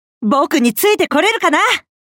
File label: attack; MouriKi